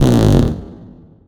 BASS, Drum & Bass 175 BPM; Ueberschall; Drum & Bass Flash Trackz

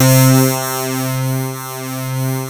PPG POLY C3, analog; USB Soundscan vol.49; Analog Fantasies; AKAI

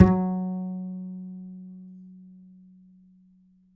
D, Upright Acoustic Bass; Larry Seyer; SWITCHABLE POSITIONS

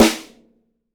yamaha snare

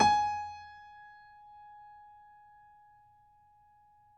piano; East West; The Ultimate Piano Collection

